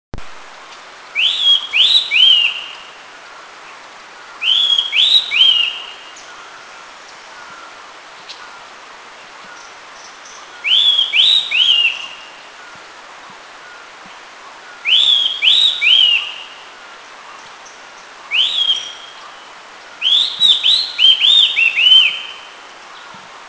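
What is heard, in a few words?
Rufous-chinned Laughingthrush respons song; Eaglenest; India; song -beauty, species only on B2A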